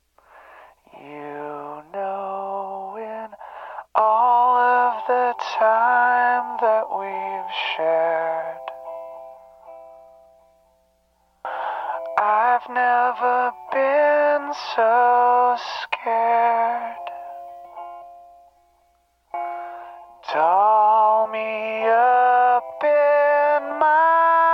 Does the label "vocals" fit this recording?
Yes